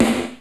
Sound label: D3H-SNR; Zero G; Man Machine